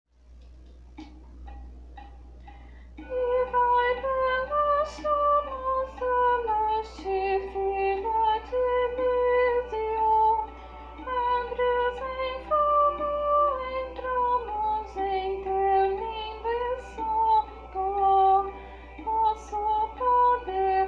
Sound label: an die freud ode a alegria soprano